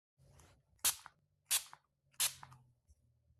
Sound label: spray